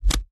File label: Human body parts being chopped or amputated. Mutilation, Body Dismemberment, Gore Chopping, Flesh